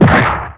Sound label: Bird Monster Feet; Playable Tutorial Level; TR2